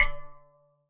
Tech Drum Hit, techno 120bpm

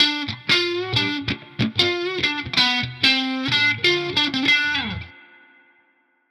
Guitar Line, hiphop; musicradar; 94bpm

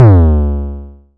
Vermona Kick; techno drum fx; Analogue DrumHits

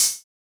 Open hat, techno drum

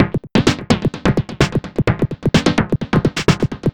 Beat 128BPM (Full No Kick), techno

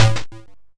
Snare, YM, CHIPSHOP HITS DRUMS; ChipShop Deluxe

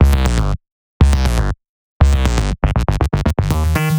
Tech Bass 120 G, techno; musicradar; 120bpm; Bassline

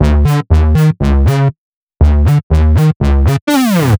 Tech Bass 120 D, techno; musicradar; 120bpm; Bassline

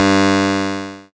GB Synth G; SYNTHS; CHIPSHOP DELUXE; CHIPSHOP HITS

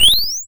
FX Whistle; SFX; YM; CHIPSHOP DELUXE; CHIPSHOP HITS

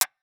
Tech Drum Hit, techno 120bpm

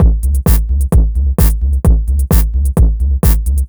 130BPM Drums Bass, techno; drum fx; Beats

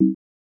Short Mellow Stab, techno drum fx; Single Hits

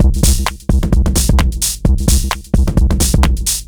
Beat (130BPM), techno; techno drum fx

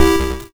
YM Chord G; ChipShop Deluxe; ChipShop Hits

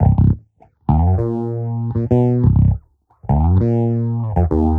Bassline 100 C, hiphop, 100bpm, Bass; musicradar

